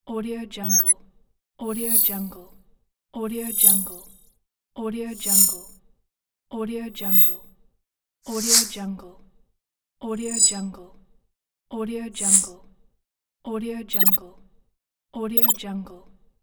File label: digital transitions